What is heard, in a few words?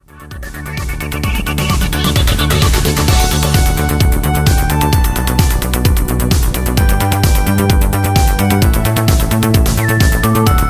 karaoke